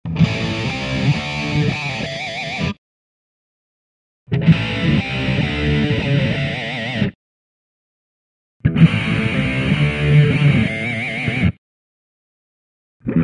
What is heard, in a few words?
Cab Tuning Low; GNX1